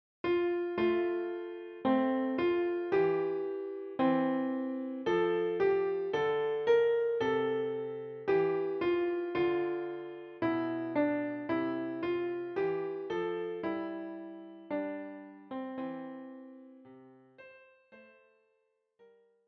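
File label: O come all ye faithful, Klavier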